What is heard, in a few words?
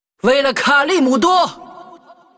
hero female demon hunter warcry